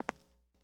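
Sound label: Foot Concrete Shoes Walk; Lucius III; pc linux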